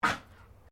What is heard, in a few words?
Animal Dog Chihuahua Cough; Various domestic dogs; Dogs (Sound Effects:Animals)